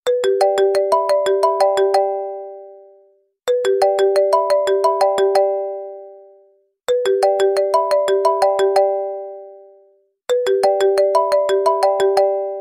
cool message

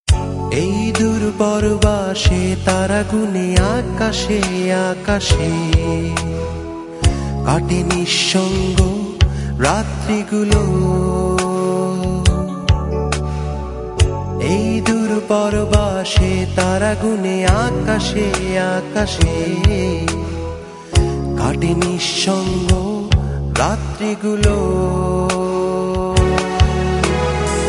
Band; Bangla

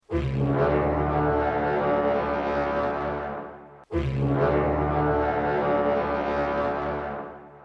War Horn

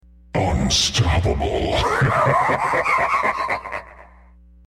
Hahahaha

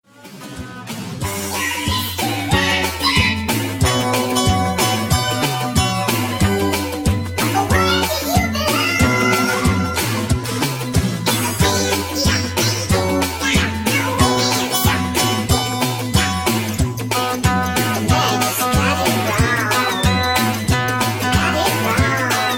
Wrong

